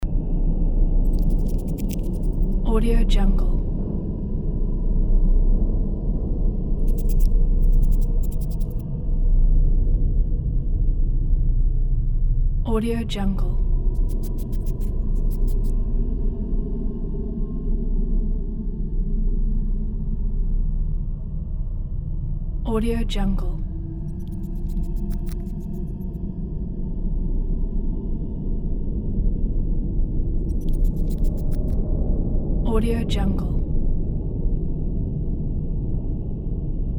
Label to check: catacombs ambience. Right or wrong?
Right